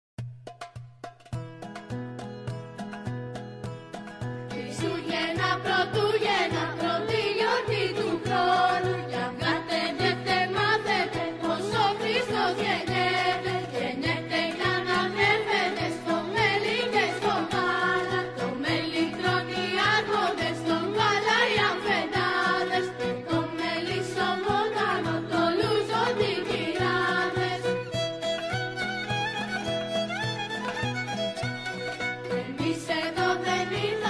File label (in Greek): Κάλαντα Χριστουγέννων Πελοποννήσου; Kalanta